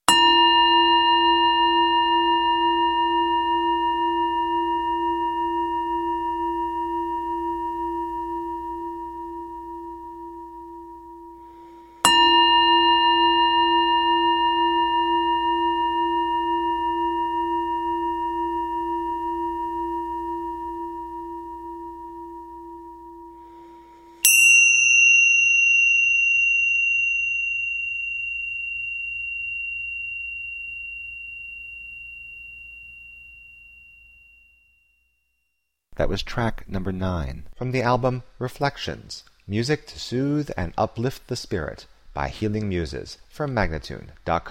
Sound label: Tibetan Bowls Bells